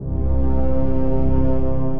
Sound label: Synfonic, Distressed Strings; Instruments; Ableton; Mood Reel